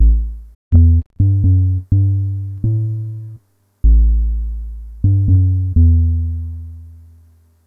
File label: Bass Standard, Synth Bass Loops; Sonic Foundry (Sony Creative Software); Groove Elements